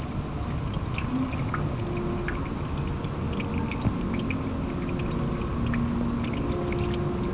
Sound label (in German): Brunnen; wenzelskirche; Naumburg